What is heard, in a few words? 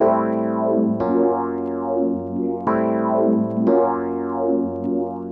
PIANO 90 A; old rec